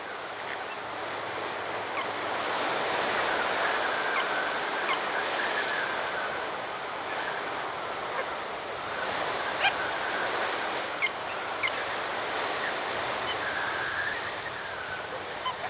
SFX loop; Disney's Dinosaur; tutorial

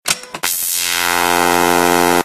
Turn On Generator

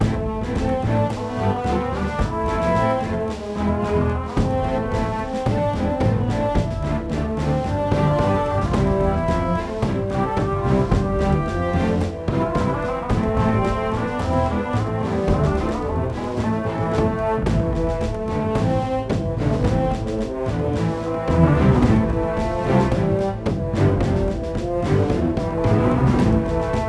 Duel Music Neutral

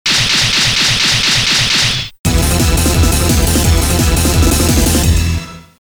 Game Over (All Clear); Raiden Fighters Aces

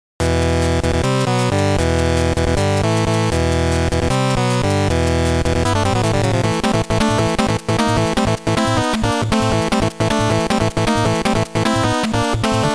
Boss BGM; G.I. Joe A Real American Hero; NES

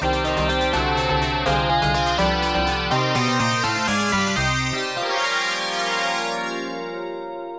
Game Over; Legend of Game Music Premium Box; Section Z

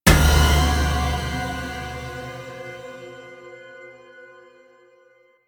Stinger Fail; starcraft 2 wings of liberty

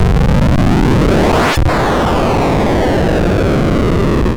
Warp; tempest 4000

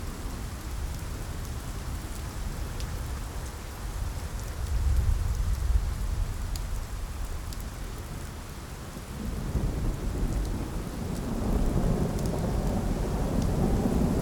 Rain And Thunder; Lego Harry Potter Years 5-7 Windows 2011

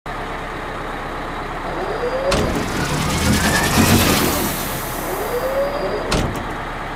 Atomic Idle

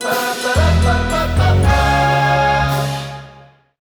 2nd Place Goal Fanfare; Pyramid Pass; crash bandicoot series sound box

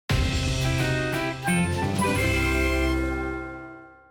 Jingle Race Lost; Thomas & Friends Go Go Thomas

